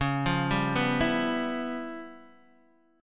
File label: Db 7th Sharp 9th Flat 5th Db down Guitar Open D